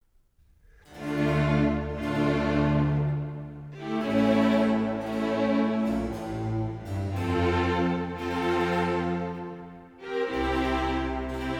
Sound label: Keyboard Suite in D Minor Sarabande